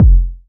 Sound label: KICK